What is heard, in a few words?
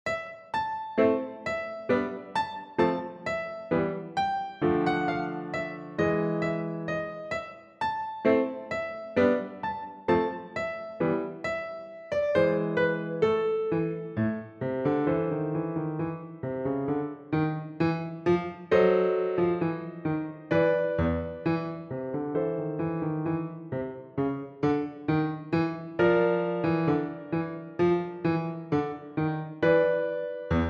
Staccato Strut, piano